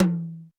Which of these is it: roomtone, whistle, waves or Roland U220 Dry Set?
Roland U220 Dry Set